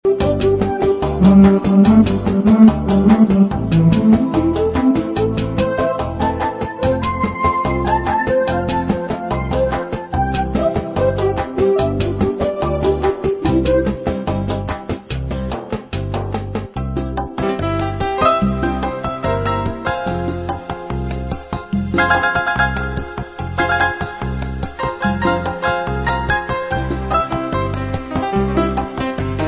Syncopated

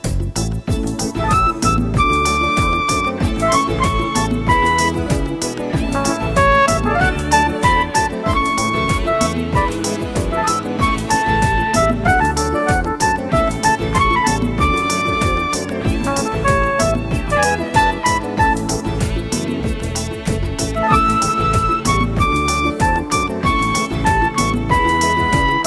Dance the Reggae

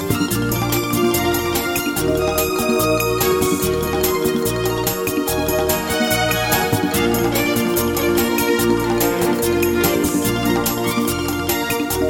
Soft Bossa Nova

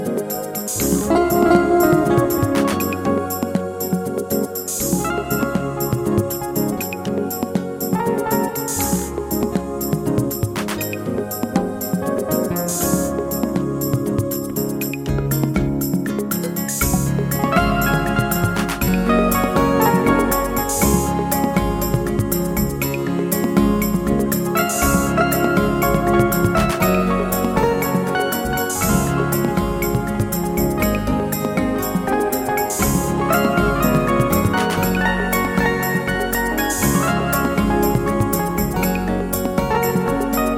Bossa Nova

